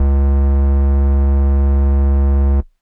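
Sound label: Air Bass Kawaii C#1; keys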